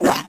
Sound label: squished; Crypt of the NecroDancer